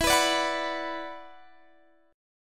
E 7th Suspended 2nd, down; E7sus2; strummed

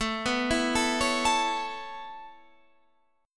B 7th Suspended 2nd Suspended 4th, chord

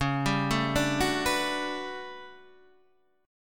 A 13th C sharp, guitar chord

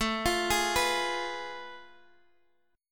F Diminished A; Fdim/A arpeggiated; JGuitar

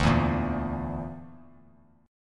D Suspended 2nd Sharp 5th D Bass Standard; x,5,2,3; strummed

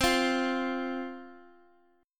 F 5th C, chord

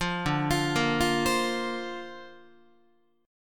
D-Minor 7th Sharp 5th D Guitar, chord; Dm7#5; arpeggiated

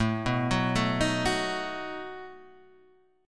A 6th Suspended 2nd Sharp 11th, guitar; A6sus2#11 Chord {5 2 4 2 4 2} arpeggiated

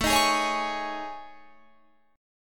A Major 7th Flat 5th, down Guitar Drop D

